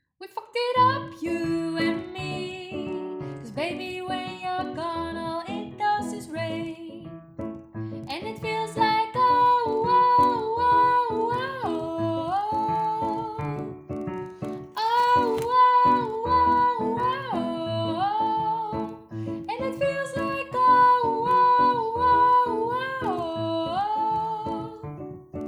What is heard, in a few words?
S2 Rain